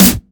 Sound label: dry steel snare drum sound f sharp key